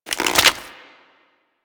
Reload, Pistol; Embolist; Warframe; Infested

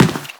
Run Default, Footsteps; Warframe; Teshin

